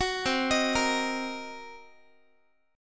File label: C Minor 7th Flat 5th C down Guitar; arpeggiated; Cm7b5; 21,20,18,20,x,x; 4A,4E,5A,5D,5G#,6C#